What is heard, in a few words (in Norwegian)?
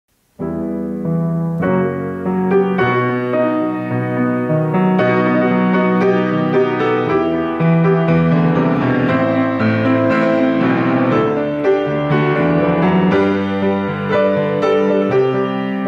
klaver